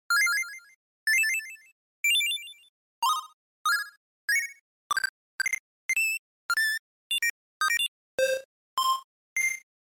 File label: GB General